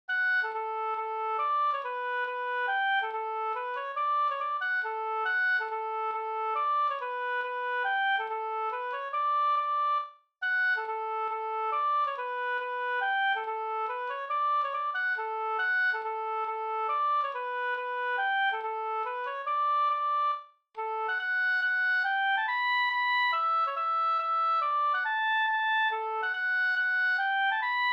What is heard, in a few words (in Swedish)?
hambopolska